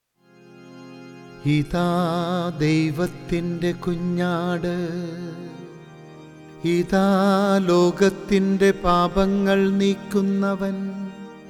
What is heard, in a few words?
Chant; ordo cantus